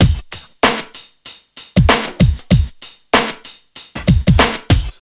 96 bpm drum loop sample d sharp key, Free breakbeat - kick tuned to the D# note.